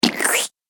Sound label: Vacuum Slime Suction; Slimy Sound Effects; Andrew Fleming